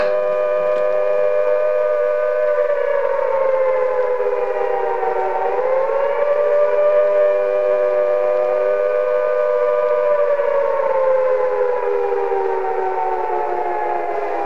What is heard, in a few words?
Air Raid